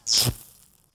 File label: Electric Shock